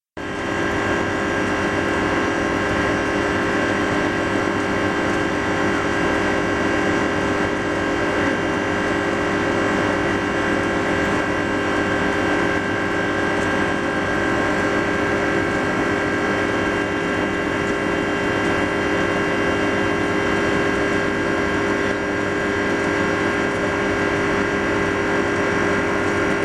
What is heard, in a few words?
fish fridge noiseless